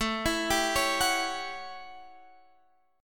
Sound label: A 13th A down, chord; arpeggiated